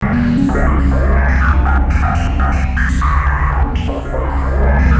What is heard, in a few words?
ATMOS; East West; Global Sounds